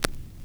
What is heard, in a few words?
RECORD, Vinyl Scratches; E-MU Producer Series Vol. 8 – Platinum Phatt